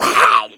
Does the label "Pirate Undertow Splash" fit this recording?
No